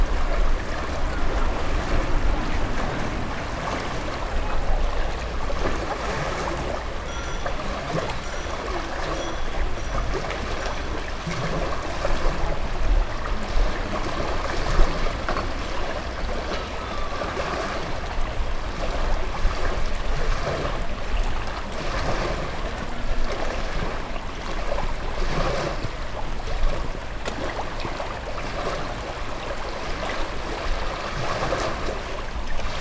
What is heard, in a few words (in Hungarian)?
csacsogó folyam sétány